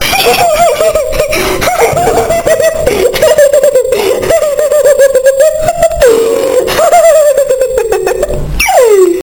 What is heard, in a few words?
Happy Laughter